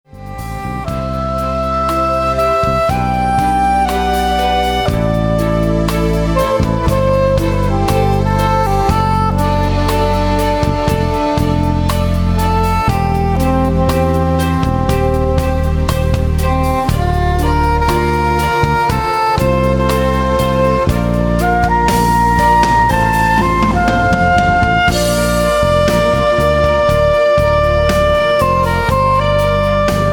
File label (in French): Chant du sax